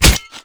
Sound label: Spear Scale, Damage